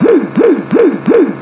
woo woo woo woo, Zaps and Beeps

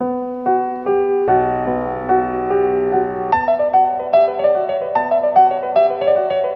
piano, Sad

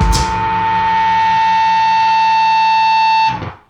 feedback; Rumbles

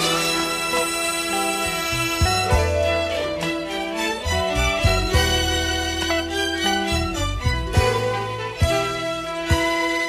horns, loops, phonky

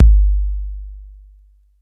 dung, kicks heartbeats

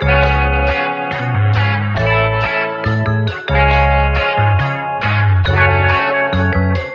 guitar, loops; Phonky

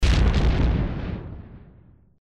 explosion; Crash Bang Boom

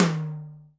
salt, toms; Real

